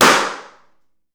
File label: Balloon Pop; SteamVR; InteractionSystem